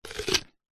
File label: horror creature monster bite each chunk crunch